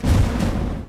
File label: Flamethrower